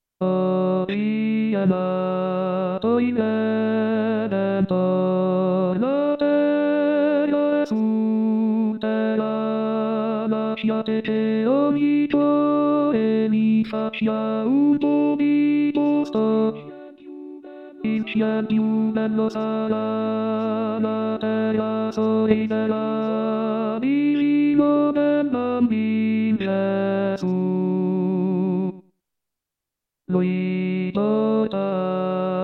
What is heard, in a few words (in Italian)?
Tenori; Joy To The World; Handel; natale